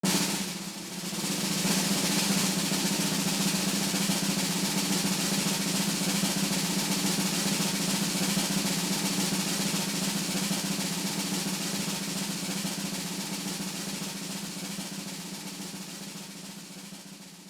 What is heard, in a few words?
Winner Drumroll; Mt. Minigame